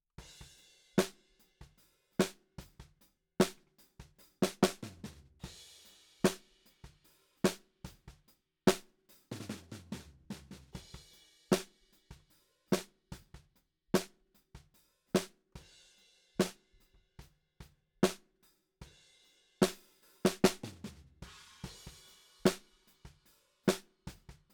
Snare Bot

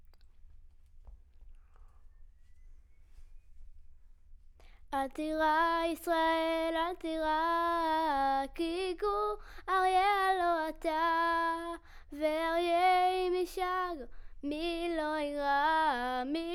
Children Choir; Studio Bekol Children Choir

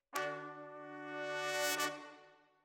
Sax

